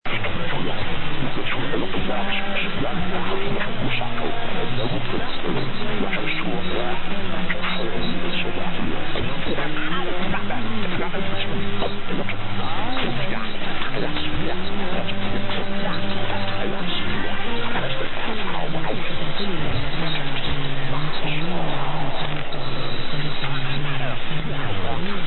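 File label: Saudi Arabia, radio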